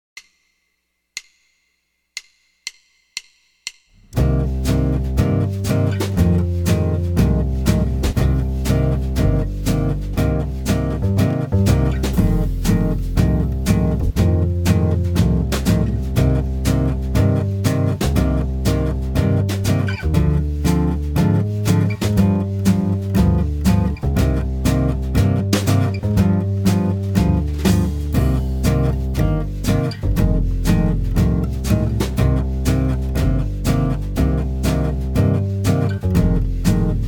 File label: C Minor Blues Root 5, jazz guitar